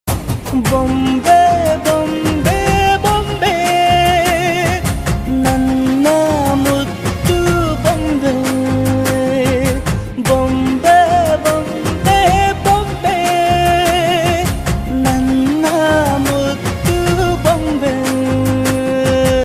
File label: ringtone